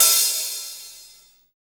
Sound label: HH, KIT Real Kit